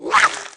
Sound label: Mutant Swipe Attack; Valleakan Mutant 1; Aliens